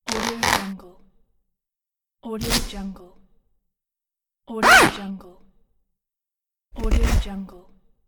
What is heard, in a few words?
unzipping